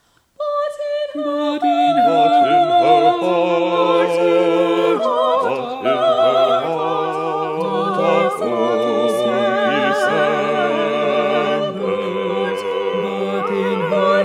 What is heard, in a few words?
balanced voices